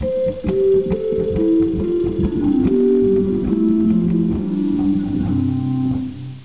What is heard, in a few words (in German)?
Gedeckt 8; Martin